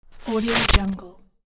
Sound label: short glitch; kaelv